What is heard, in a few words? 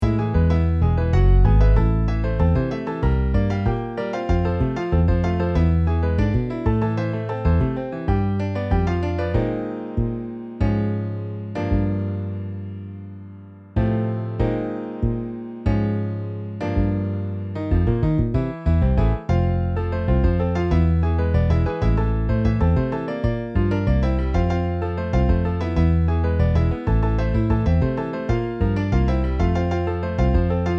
Piano Bass